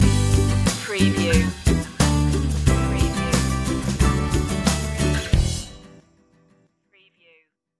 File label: happy journeys stinger